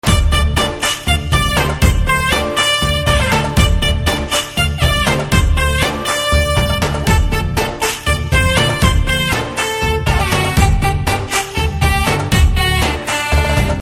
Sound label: Start Musik Tone